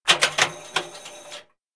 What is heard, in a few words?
ticketmachine; Minecraft; Unleashed; openperipheral